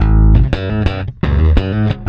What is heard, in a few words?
PWR A#, Bass, PWRSTRUM; Spectrasonics; Bass Legends; 128 PWRSTRUM